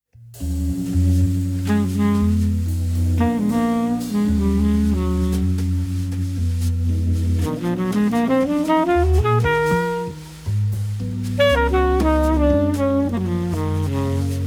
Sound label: vocal group feat. organ trio